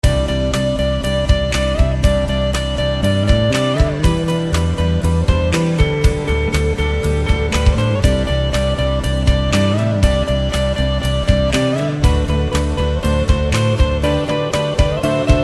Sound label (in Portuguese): INSTITUCIONAL; pack de tilhas e efeitos 2.0